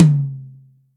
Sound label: Dry Hi, Acoustic Tom; Yamaha DTXpress